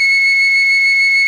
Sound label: flute F, FLUTES; Best Service ProSamples vol.55 - Retro Sampler; AKAI